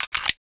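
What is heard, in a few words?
p226 magazine, weapons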